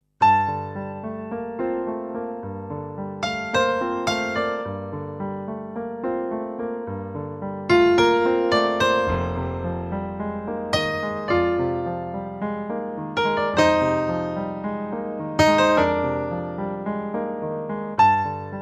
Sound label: piano